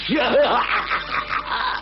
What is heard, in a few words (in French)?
rire